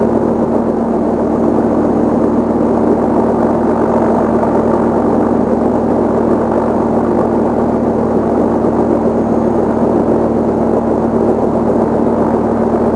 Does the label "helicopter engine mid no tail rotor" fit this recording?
Yes